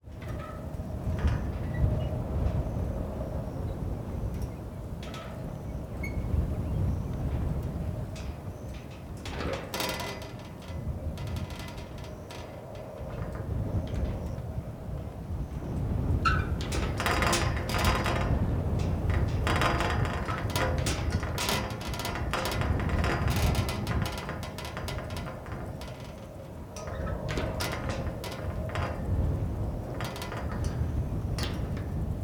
nature wind blowing against loose metal panel squeaking rattling in wind; felix blume